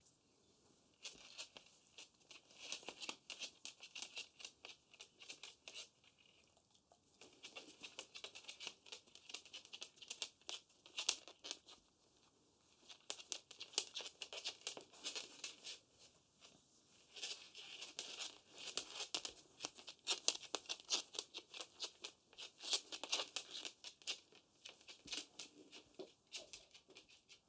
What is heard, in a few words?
Dog Walking